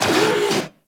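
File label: Research Facility Repair Robot Rotate; Warframe; Venus Open World; Objective Rooms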